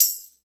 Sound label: TAMB MED, ETHNIC PERC; Roland - LA Composer vol. 1